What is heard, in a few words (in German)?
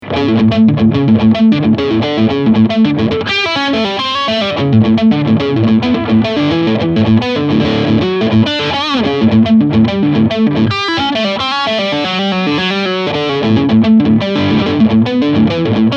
Full OD Tone 12 Uhr